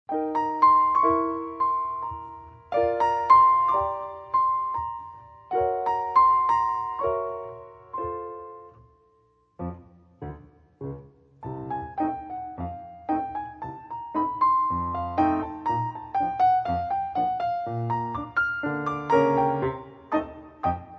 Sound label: Medley Ragtime